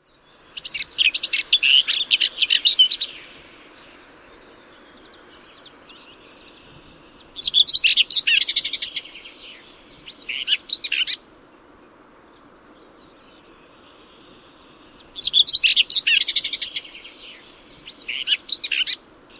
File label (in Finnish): pensaskerttu